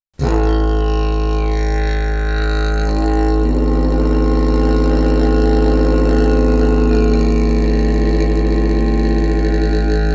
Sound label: didge bamboo